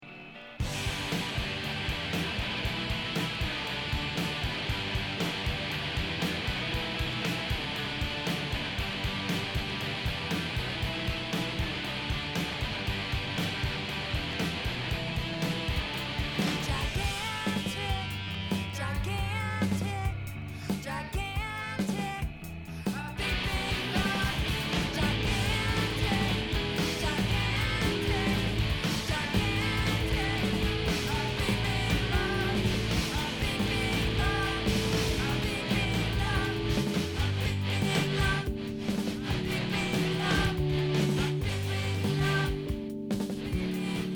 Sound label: Gigantic Bell